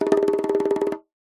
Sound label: cartoon run fast bongos; zapsplat